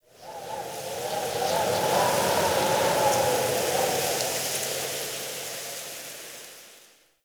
Night Wind Gust Medium; Warframe; Eidolon Plains; Ambience

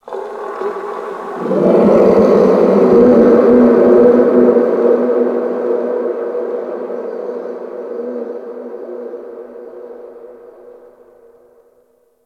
Ice Creature Distant Cry

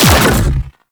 Electric Tether Shot; Warframe; Railjack; RailJack Weapons

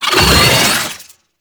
Lunge Cast Vocal; Warframe; Oraxia

